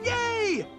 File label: YAY; Tangled